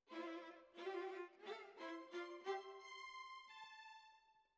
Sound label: Violas, jazz for piano